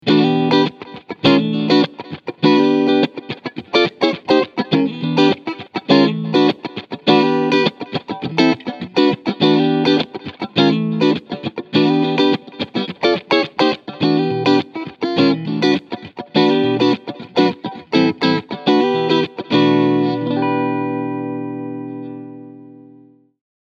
Kramer Guitars Focus VT211S Cleansound Front Middle Pickup